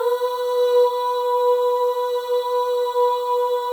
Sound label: OH, FEM, Symphony of Voices; Spectrasonics